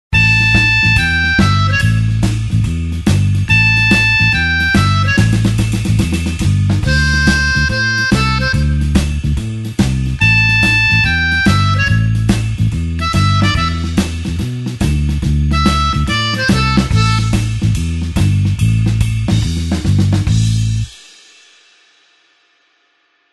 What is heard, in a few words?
blues harp shuffle A